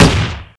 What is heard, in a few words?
fire; gr9